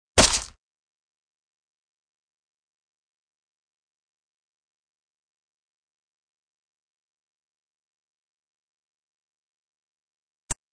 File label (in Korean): Fights, 싸움-전투; 헐리웃특수효과음